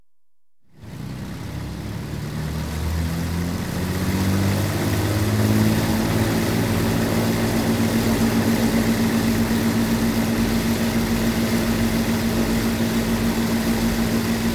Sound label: Avro Lancaster World War II Bomb; Aircraft; BBC Sound Effects Library